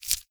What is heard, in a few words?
move